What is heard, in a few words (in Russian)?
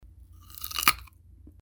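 biting a carrot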